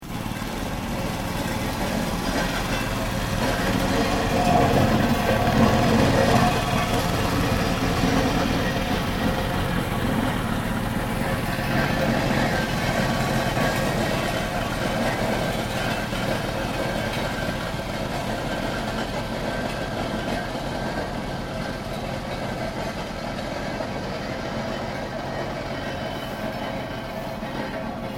MACHINE HAND PUSHED MECHANICAL ROLLER MANEAUVERING, Road roller